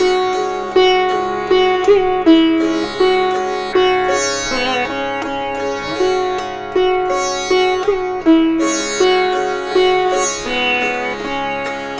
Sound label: Sitar, East Indian; Sonic Foundry (Sony Creative Software); Continental Drift Aftershocks